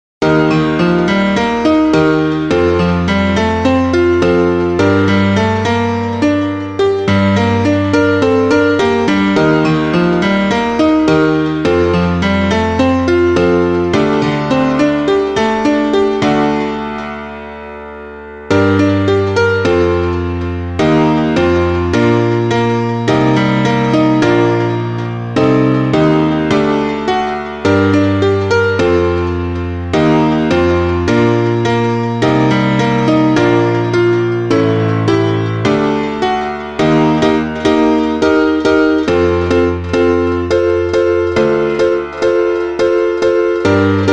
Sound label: piano